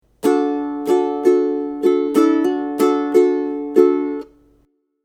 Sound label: hammer on, ukulele